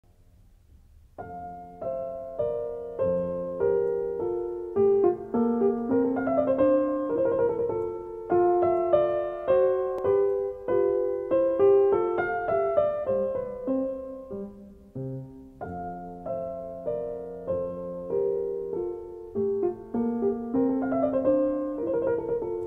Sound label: Mozart Minuet in F Major